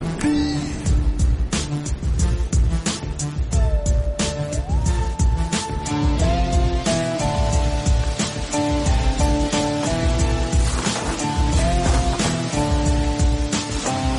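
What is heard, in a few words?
background music Ringtone; Allu Arjun; Julayi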